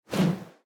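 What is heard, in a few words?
movement swing; Smite; Cabrakan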